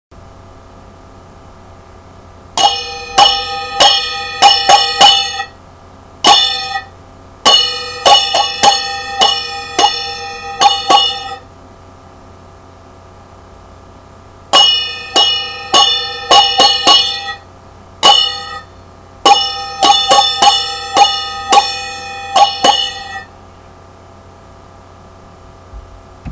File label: perc